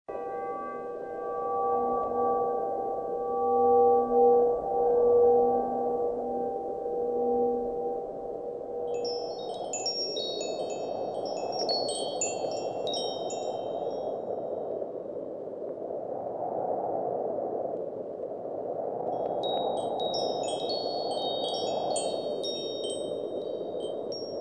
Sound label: Wind